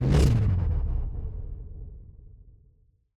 whoosh sound design power down groan choppy very high; sonniss gdc